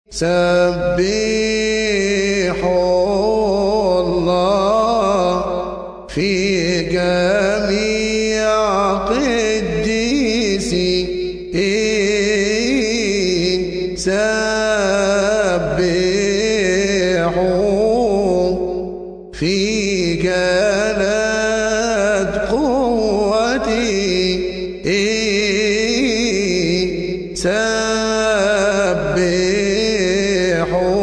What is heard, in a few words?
mazmor 150 arabic